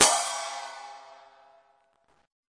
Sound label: classic hh Cym; STYLE KITS (Hip Hop, Etc); Classic Hip Hop Mega Kit